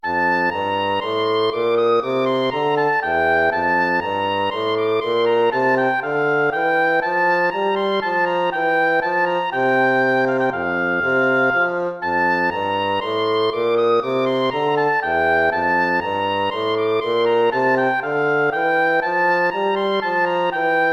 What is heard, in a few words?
baroque minuet major